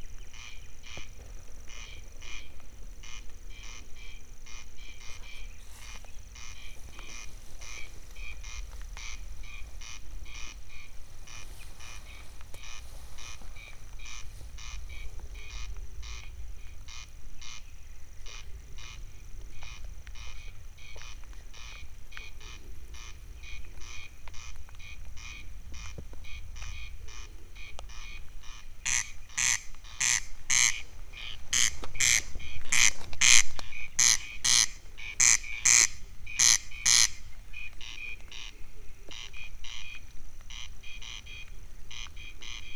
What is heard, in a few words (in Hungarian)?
közben fordulok több madár, haris; Teresztenye